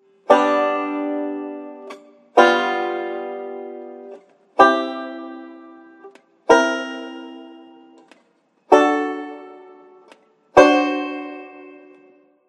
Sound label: Root Position Chords, banjos